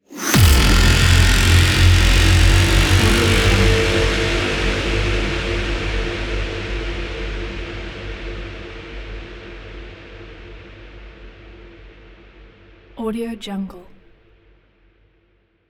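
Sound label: cinematic mega horns; soundmorph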